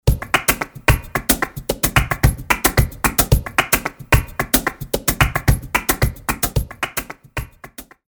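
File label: Bulerías 222bpm, flamenco loops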